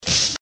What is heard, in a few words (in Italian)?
schiaffo; Inquisitor 3